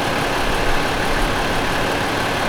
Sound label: car